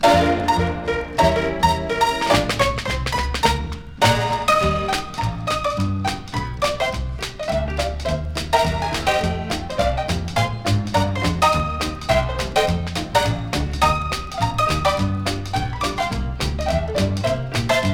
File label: barrelhouse honky tonk piano stereo